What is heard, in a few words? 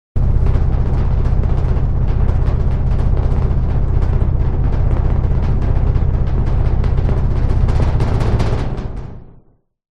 vehicle underwater or space resonation metal; lukas tvrdon